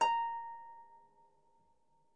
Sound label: Harp A#5; Miroslav Vitous - Percussion & Harp